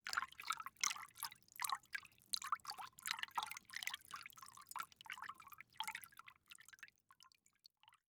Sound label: Liquid Water Movement In Metal Bowl Finger Splashing Quick